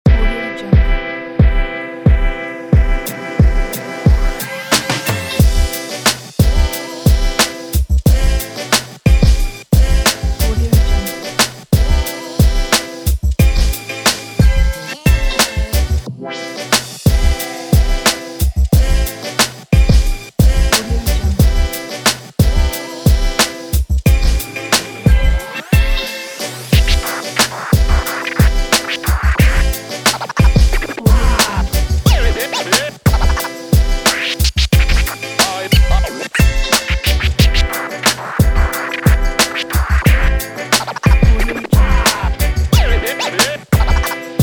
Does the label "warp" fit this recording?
No